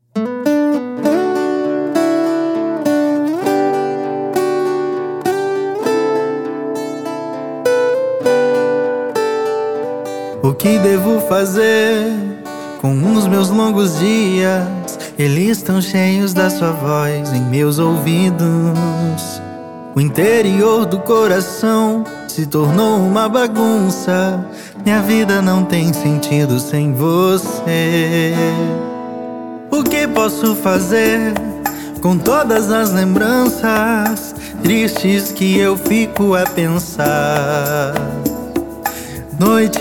estilo

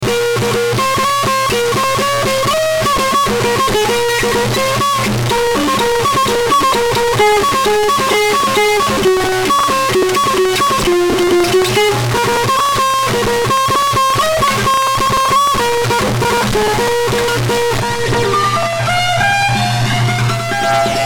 guitarras ou pseudo guitarra

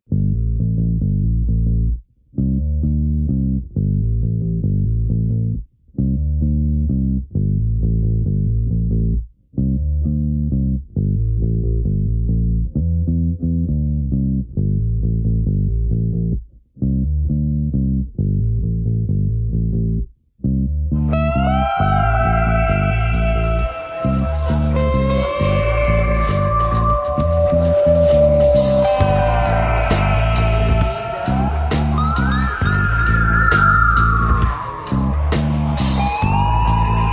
intro vinheta instrumental

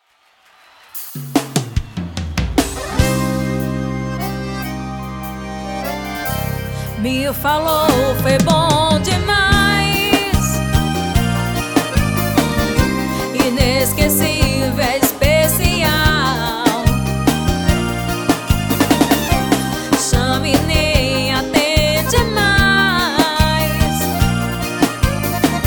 forró swing

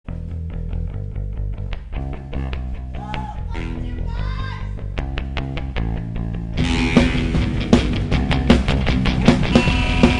frevo

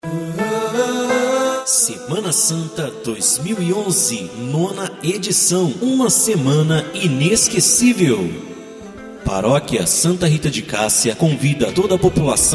vinheta; paixao de cristo 2011

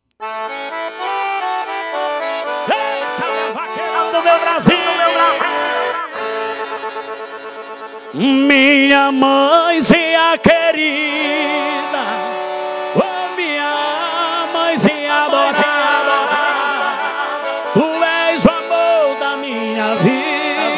toada

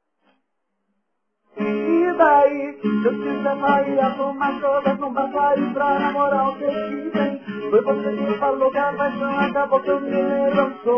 improviso

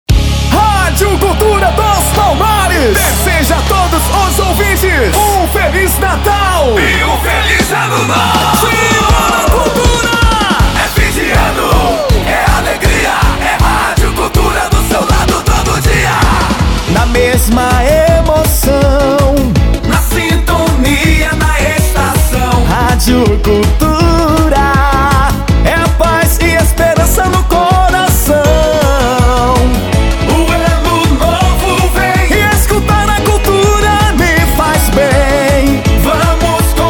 jingle fim de ano rádio; radio cultura; kzamstudio

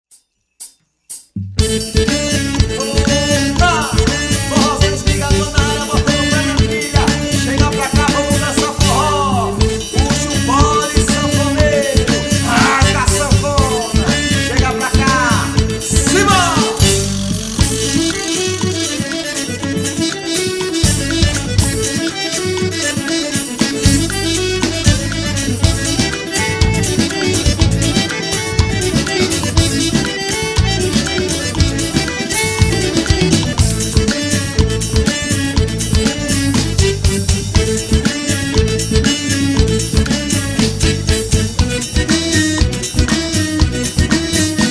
forró abertura

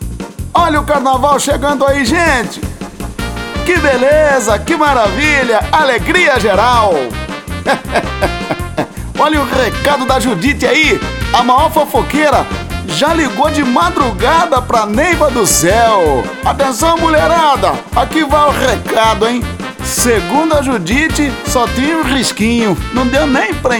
marcha

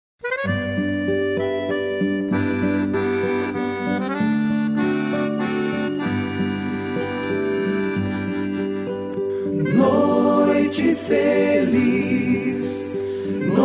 vinheta de natal

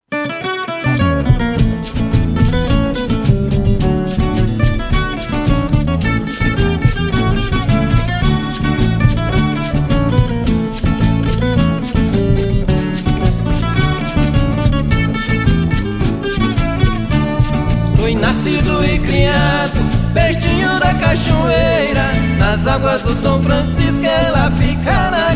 violeiro

